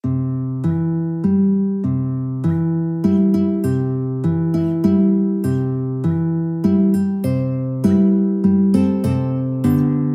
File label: lullaby, guitar